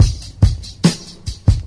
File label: Drum loops, Boombap